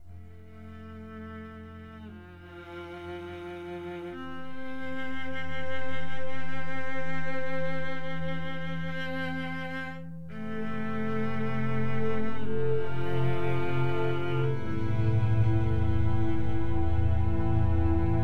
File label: Loop Sad; the walking dead michonne